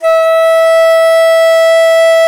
BON DI, Winds, Old World Instruments

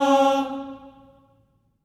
Staccato M C#4 R, Symphony of Voices; Spectrasonics

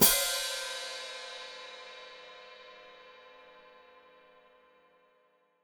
Hat Closed Open, Hi-Hat

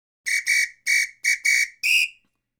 Samba Whistle; Sonic Foundry (Sony Creative Software); Joe Vitale Latin Percussion

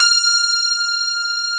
GRAND PF F 2, AKAI S-Series

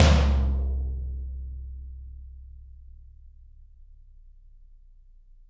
Toms, Drums; Bob Clearmountain Drums I

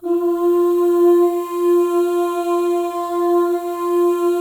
MOVING V1, Symphony of Voices; Spectrasonics

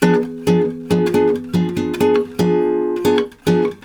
F DIM GTR, Brazil Bossa; USB Soundscan; AKAI

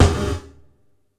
Gated Snare; Gated Reverb; Univerb; musicradar

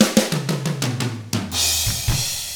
116 SHUFFLER, Drums

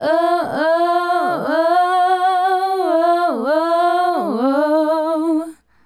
Female Group, Hip-Hop and RnB Vocals

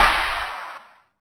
Drums; Distored Reality

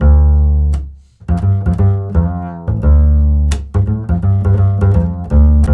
acid D, 85 acid swing, bass; Spectrasonics; Bass Legends

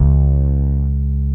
DIRTY BASS, Electric Basses; PlatinumPhattCD2; E-MU Producer Series Vol. 8 – Platinum Phatt

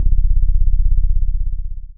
Dance Floor Bass, Multisample Basses

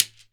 SHEKERE SI, Synclavier Percussion; ILIO; Synclavier Percussion 2; World & Orchestral

